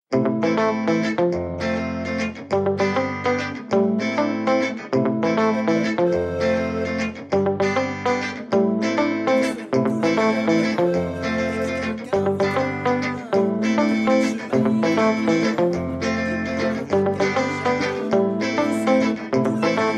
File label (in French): musique; Blind test – Rallye Touristique